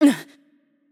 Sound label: Hurt Light; bloom minigame